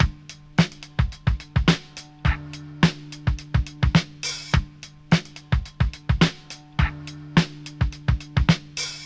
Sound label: keep your distance, Drum loops Boombap